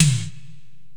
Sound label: Korg x5, Drums